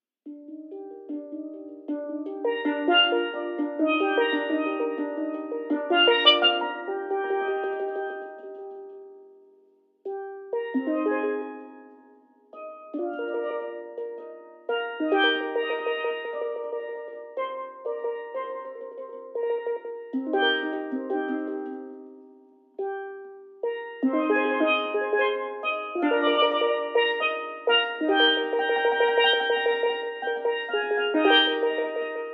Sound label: Jazz Band